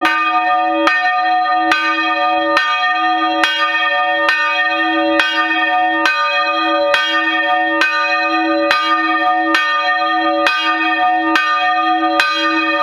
Glocke IV, kirche

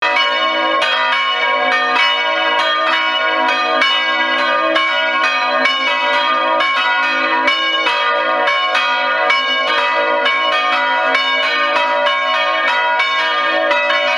Glocke IV III II

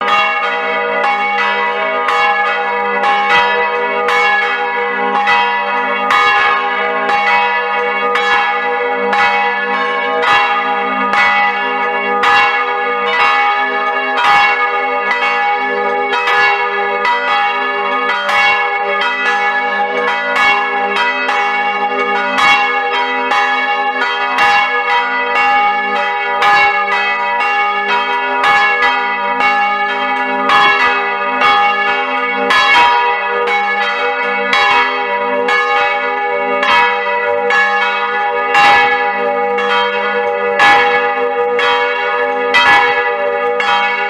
Glocke II I

